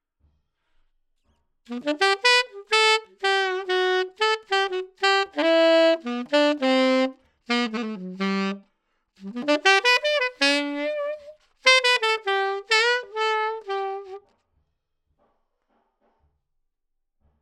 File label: RCA 44BX, Sax